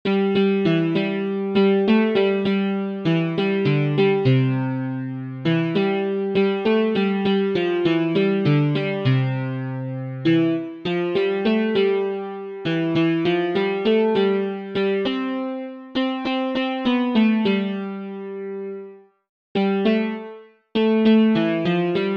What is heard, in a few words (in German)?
noahsong klavier